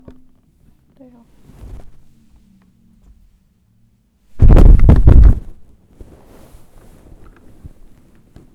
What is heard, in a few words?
falling Down